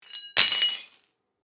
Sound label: Glass shards drop